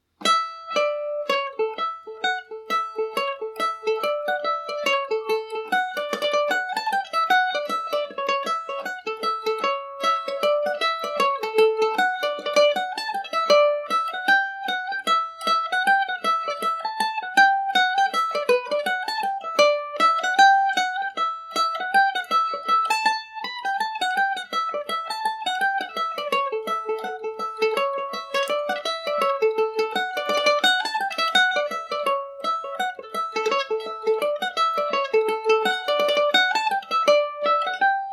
Irish mandolin; orourkes revisited

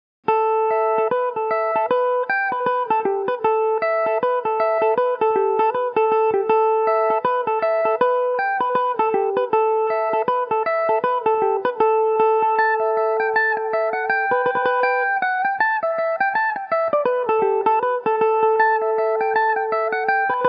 errigal reel ador, mandolin

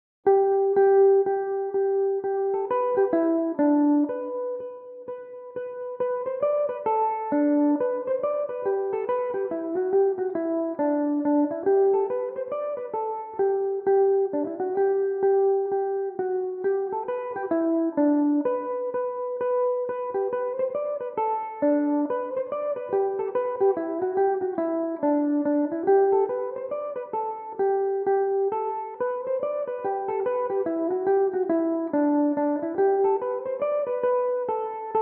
A set of barndances, mandolin; Lucy Farr's/The Dances At Kinvara; Belmuse

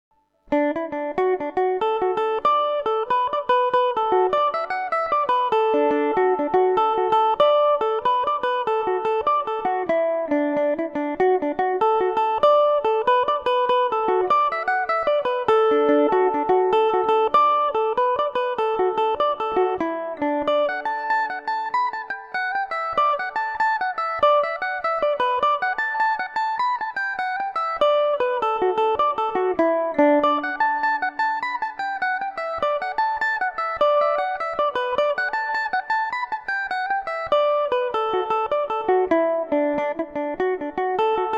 scotsman over the border dmaj, mandolin

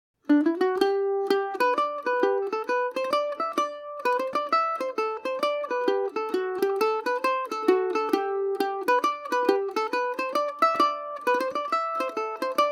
farewell to forever gmaj, mandolin; Paris Swing Macaferri mandolin

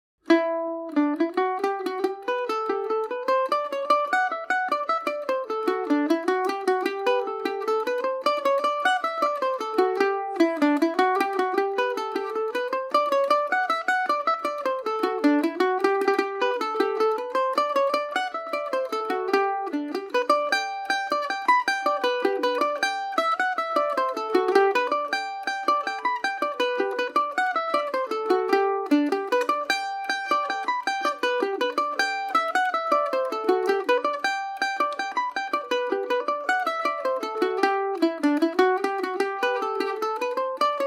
killimor jig gmaj, mandolin

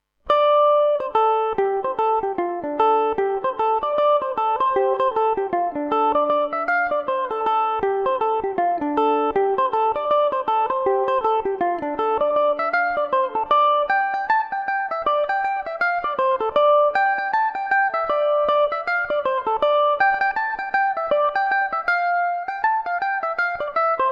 jolly hake gmix, mandolin

